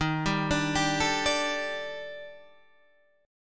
D sharp Major Sharp 11th D sharp Guitar Standard, chord; arpeggiated; 11,10,12,12,10,10; JGuitar